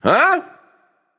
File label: confused; Smite; baronsamedi